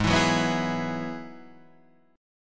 F sharp 7th Suspended 2nd Suspended 4th, G sharp

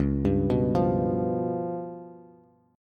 C sharp 7th Flat 5th, Bass; arpeggiated; chord; 9,10,9,10

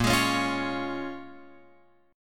Bb Minor 7th, Guitar, Open F, chord; Bbm7 strummed

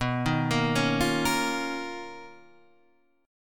B Major Sharp 11th, Guitar, Drop D

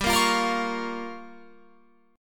G Minor 7th Flat 5th, Guitar Open F; Gm7b5; strummed

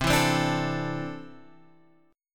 C 6th Add 9th, C, down, Guitar, Half Step Down

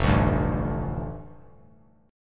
F 6th Add 9th F down, chord; F6add9 strummed

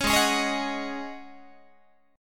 Ab 7th Suspended 4th Ab down Guitar; Ab7sus4 strummed

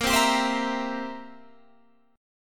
C 7th Bb

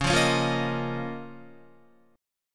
Db 6th Add 9th Db down Guitar; Db6add9; strummed

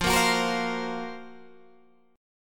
Gb Major Sharp 11th F

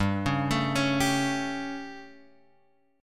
G Suspended 2nd Flat 5th G Guitar Open G

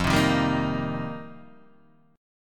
F 9th Suspended 4th F Guitar Open D, chord

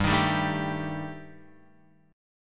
G Suspended 2nd Sharp 5th G, Guitar Open F; strummed; x,2,3,2,0,2

